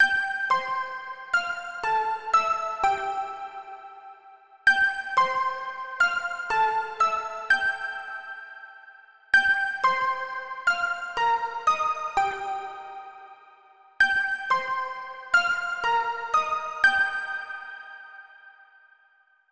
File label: Pluck Melody; super crush k.o.